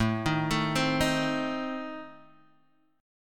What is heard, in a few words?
A Diminished A, Guitar Half Step Down